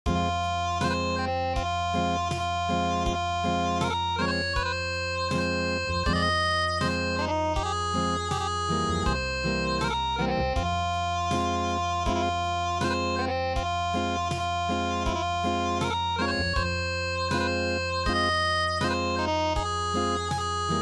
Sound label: Unplugged